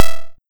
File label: Kick Fx Techno